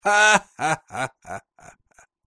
risa forzada Forced laugh

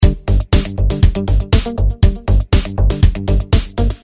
rhythm loop funk dance

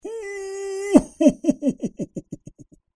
risa chipancera Laugh chimpanzee

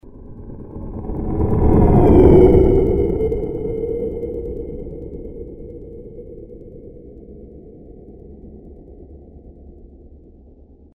Spaceship dopler; Sound Graph

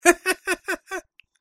risa traidora laugh treacherous

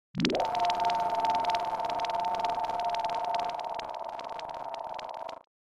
Electronic system sistema electronico; Sound Graph

